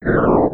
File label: Fading Whooshing